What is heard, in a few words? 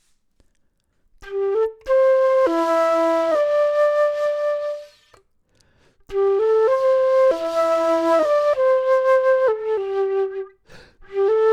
flute